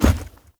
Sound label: Heavy Grunt Boot Walk Default, Footsteps; Sinecure